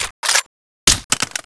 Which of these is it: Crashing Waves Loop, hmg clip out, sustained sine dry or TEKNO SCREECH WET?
hmg clip out